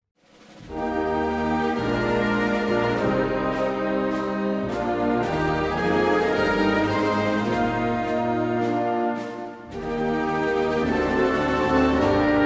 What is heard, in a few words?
Canadian Anthem